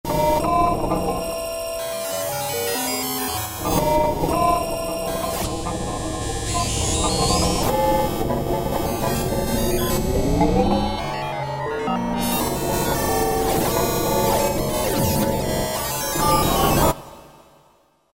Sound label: Roland V-Synth; Elektronoiz; Factory Patches